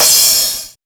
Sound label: D&B Crash; neuro